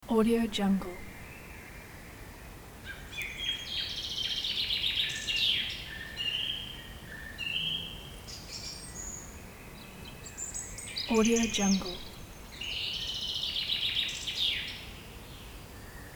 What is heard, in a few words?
forest midday birds